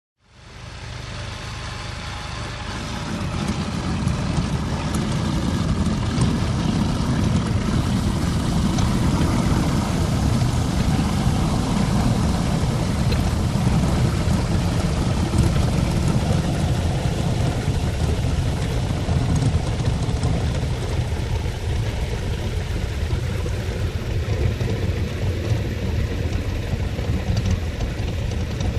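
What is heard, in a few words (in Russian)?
звуки перемалывание мусора машиной станком звуки скрежета метала.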